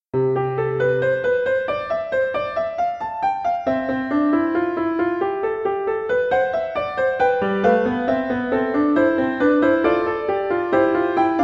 invention a trois voix en do majeur

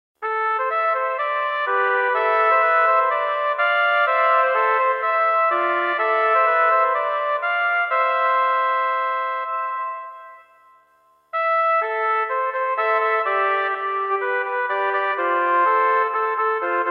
petit duo en la pour trompettes

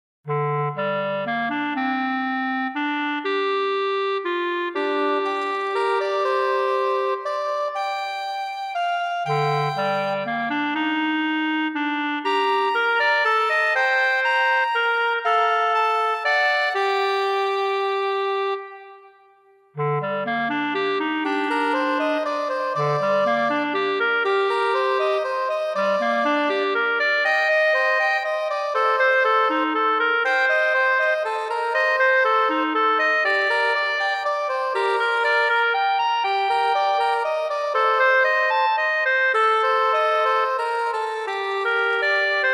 petit duo en la pour clarinette et saxophone; aubert francois

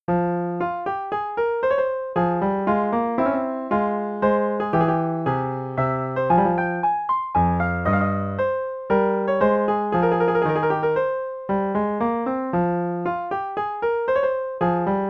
menuet fa mineur

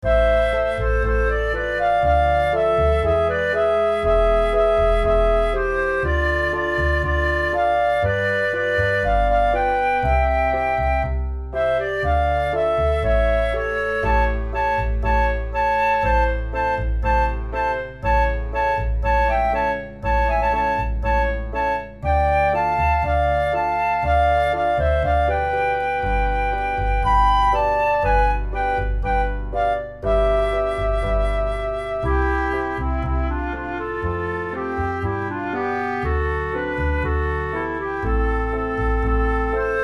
classique pop

